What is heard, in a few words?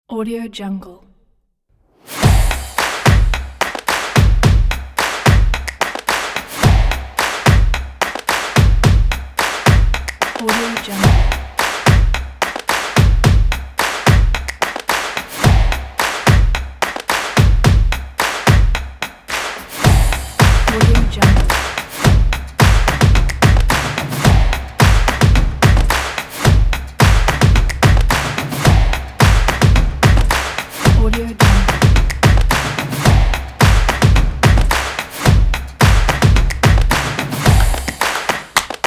the clap on